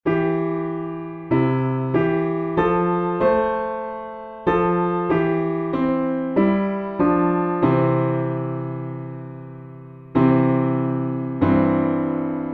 piano backing; tuba; Jesus Loves Me

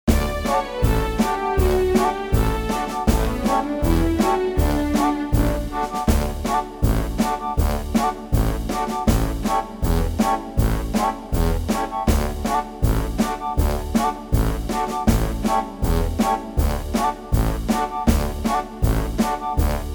backing; the galway piper; folk

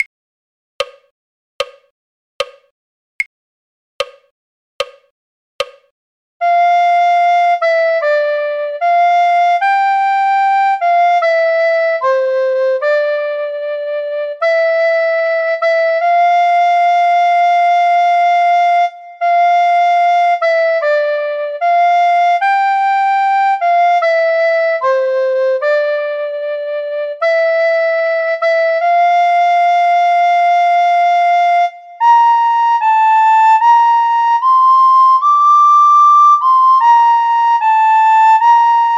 recorder; folk

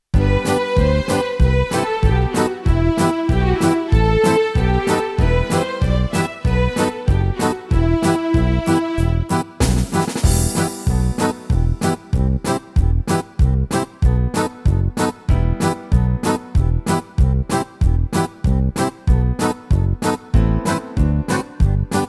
BACKING; Easy Bassoon Christmas Carols Book One

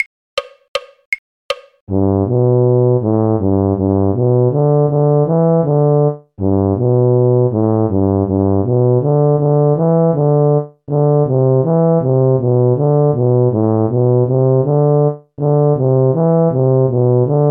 tuba; carols; flemish carol

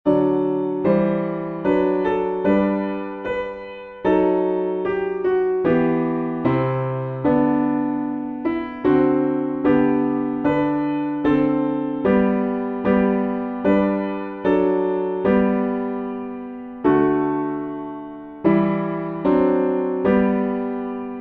the king of love my shepherd is PIANO BACKING; recorder; hymns

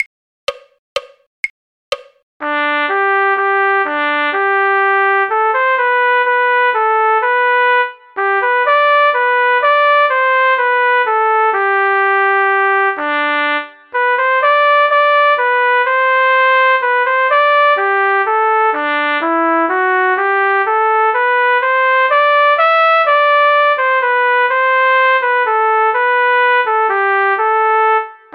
trumpet; Sweet Nightingale; folk

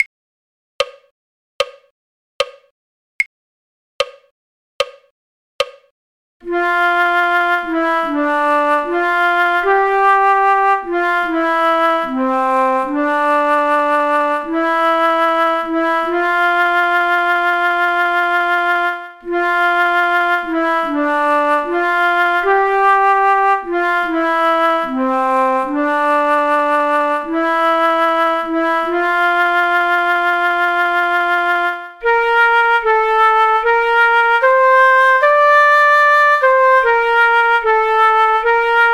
flute; folk

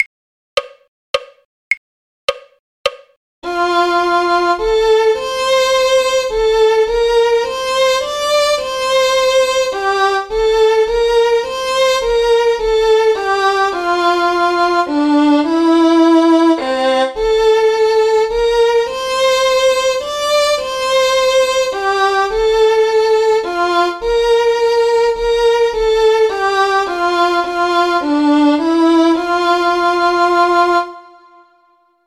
violin; carols

